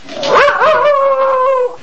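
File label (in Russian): вой короткий одиночный собачий с лаем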